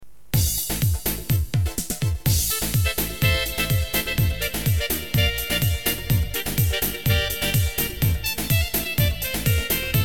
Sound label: pa 600 pop; tanitim